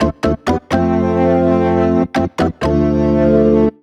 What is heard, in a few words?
DEEPHOUSE ORGAN 125 A